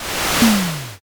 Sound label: fire magic